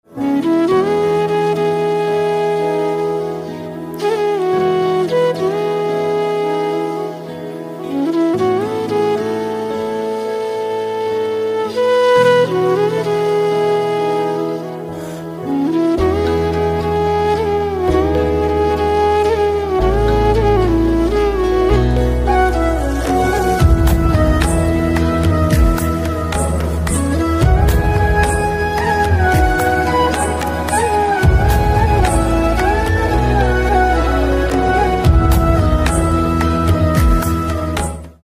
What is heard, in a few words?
Slow Version Flute